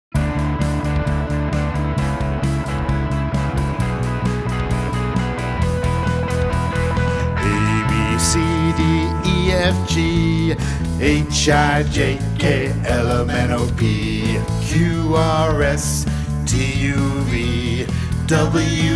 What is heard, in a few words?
Learning